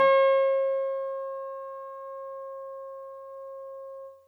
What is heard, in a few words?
540 hz; cccbr